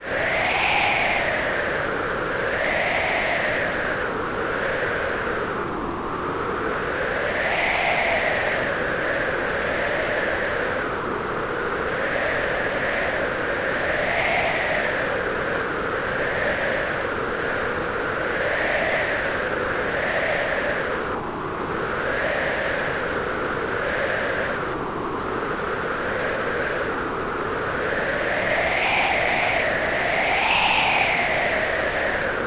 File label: wind